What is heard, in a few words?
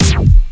dj disco effect 1beat; Plants vs. Zombies Battle for Neighborville